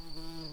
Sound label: bumblebees, Lucorum queens